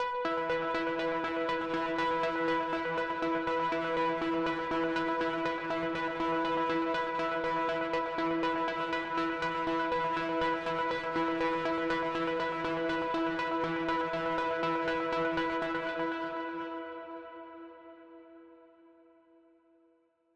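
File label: 121 BPM C# Minor Pluck, Pop; Melodies; Arena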